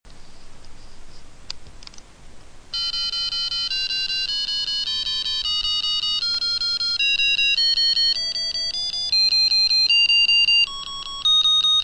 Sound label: Blues Scale